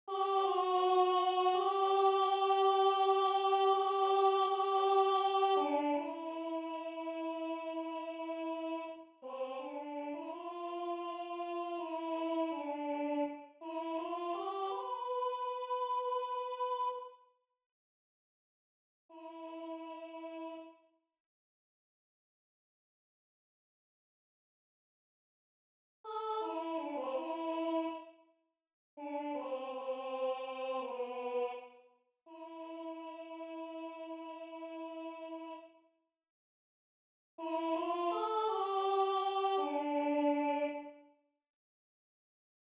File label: alto